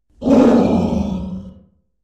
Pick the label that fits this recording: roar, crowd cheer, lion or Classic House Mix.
roar